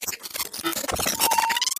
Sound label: ELCTRO EFX, static